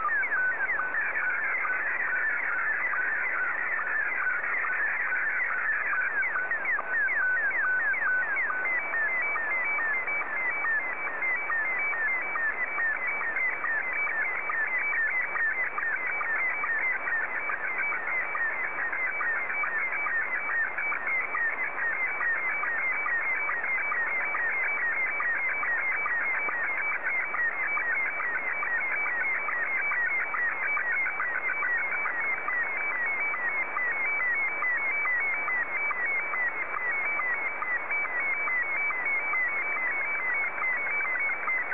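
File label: SSTV, Scottie 2; JA3QJQ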